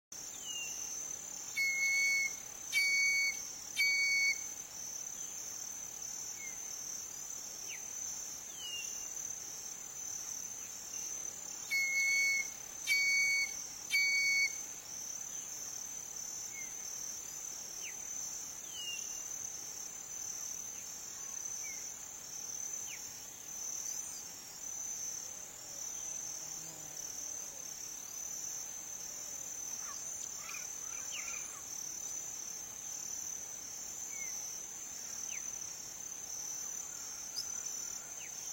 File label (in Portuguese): Canto da maria faceira